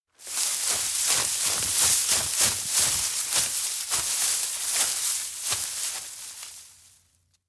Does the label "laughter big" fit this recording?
No